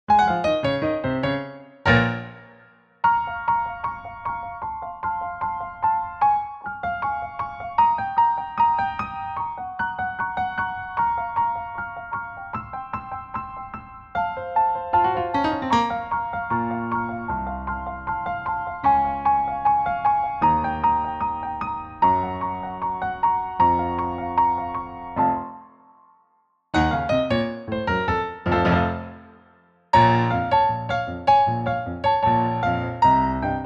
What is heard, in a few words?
audition Accomp; ADDAMS